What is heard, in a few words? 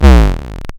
Portal